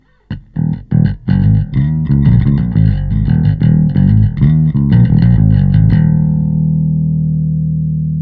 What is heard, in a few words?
bass amp; Joe Chiccarelli